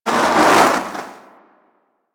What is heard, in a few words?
Eruption Fire Extinguish; Sargas Ruk